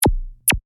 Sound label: Laser Bass Hit, to Click; Science, Fiction; Lasers